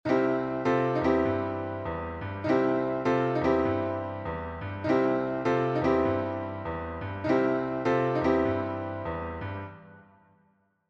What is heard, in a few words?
turn, blues piano; Blues Licks; Vincent Kerhoas